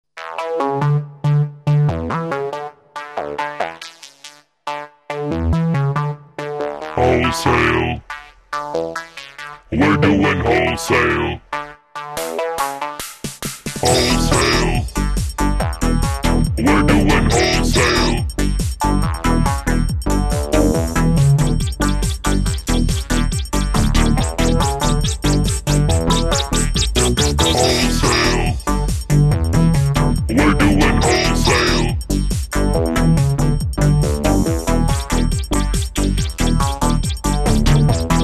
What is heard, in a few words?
techno